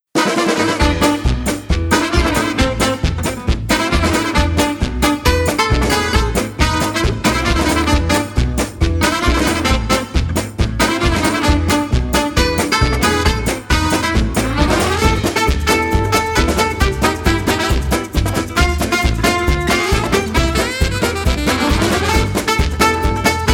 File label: Swing